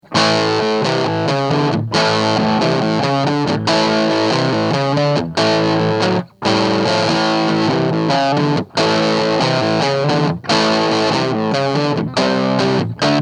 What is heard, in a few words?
clean boost; lampes